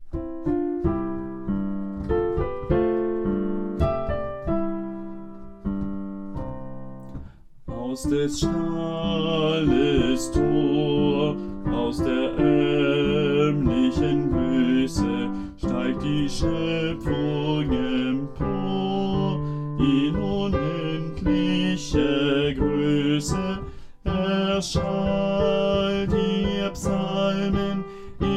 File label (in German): Alt, adventsmusik; 3. Strophe